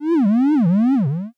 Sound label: curse